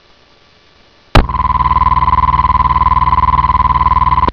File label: tube